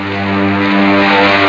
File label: tesla cannon; quake2; weapons